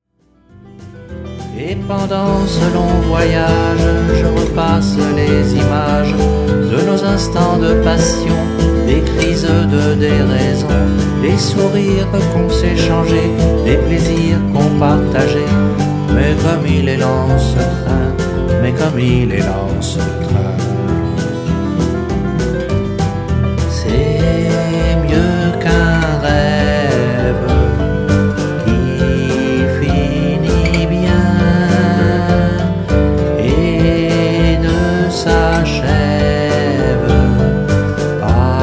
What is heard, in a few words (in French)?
Chant